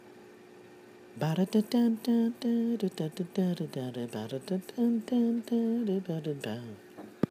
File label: Brass Horn Line